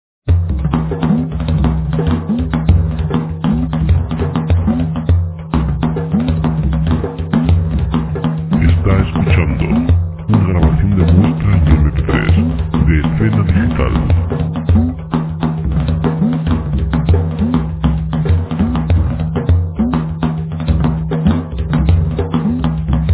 AFRICANS ETNIC RITMO

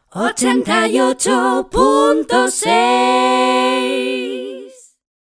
cantante jingle, Dial cantando: ochenta y ocho punto seis.; cantantes para anuncios; Cantantes de publicidad

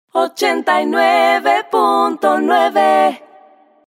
cantante singer spanish, Dial 89 punto 9; canante anuncio, cantante publicidad

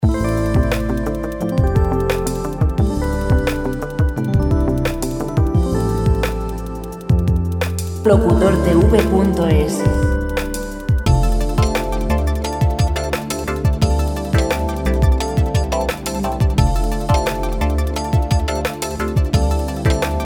musica libre 174 chill; chillout

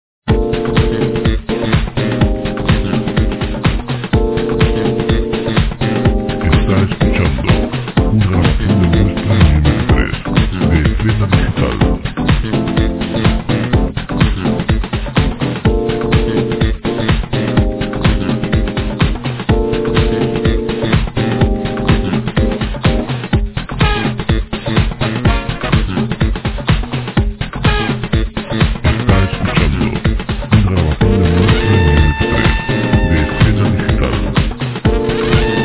jazz

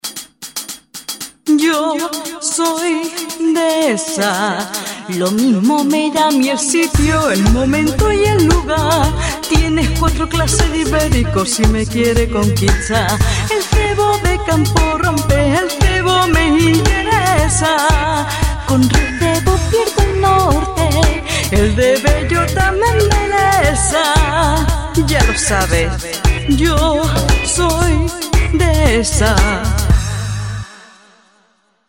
reggae cancion spot music

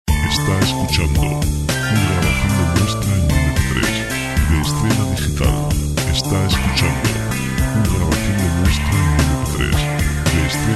sintonia media 112D; sintoniasprogramas

